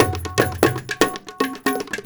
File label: India, Heart of Asia